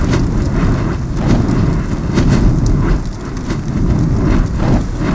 win lines; netent

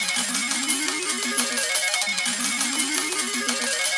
count Up Loop

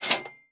cash register down; netent

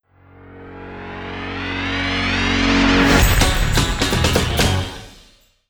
swipe Game Total; netent

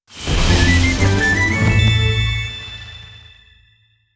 large Win Musical; netent

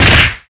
Hit Heavy Forest; netent

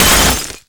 Hit Heavy Ice; netent

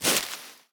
reel Bounce; netent

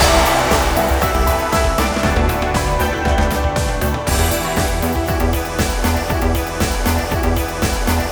Big Win Loop; starburstxxxtreme

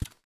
sparks reel stop; netent

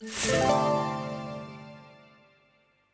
Win; fruitblaze

